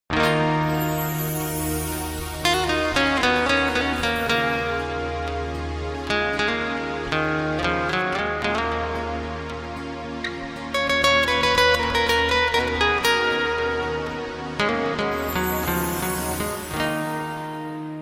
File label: slow guitar